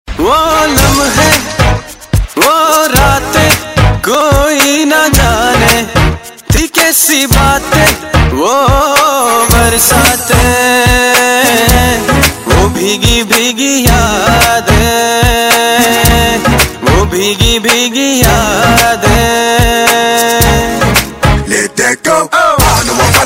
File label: hip hop bass